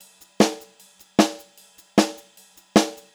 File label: Pop-Rock Drumloops; USB Soundscan vol.37; AKAI; 90 s Sample CDs; 152 POP